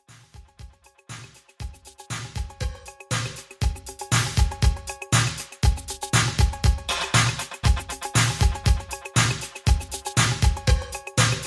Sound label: Dj Scratch Intro Outro, 119Bpm